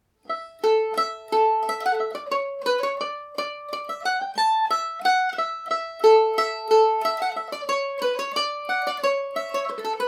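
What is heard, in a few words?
A mixolydian, Irish mandolin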